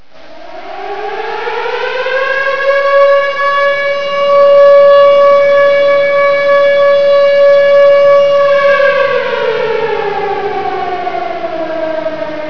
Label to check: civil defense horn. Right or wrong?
Right